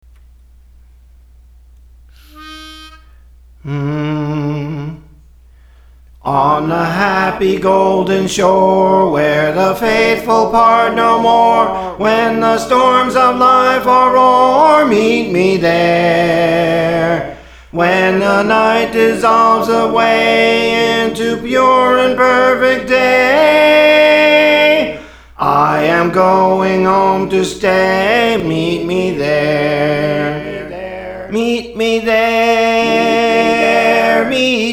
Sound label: Soprano, Songs; Praise For The Lord Training